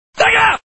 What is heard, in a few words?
Scream; Sega